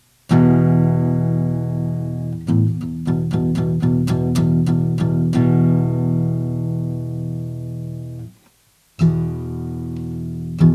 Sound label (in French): Guitare Ovation CL; ovation custom legend 1982